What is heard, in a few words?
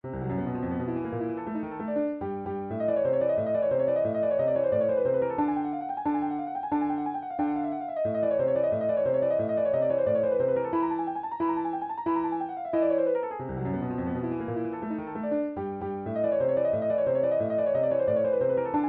Boss Theme; gameboy; Bubble Bobble